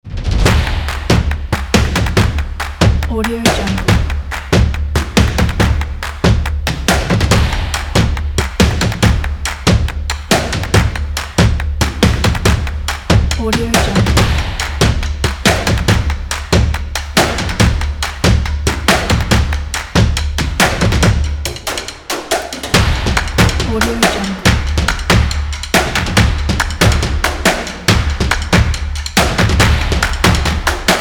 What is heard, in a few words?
percussion kit